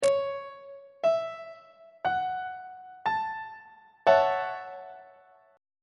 g double sharp major 6th 1st inversion on treble clef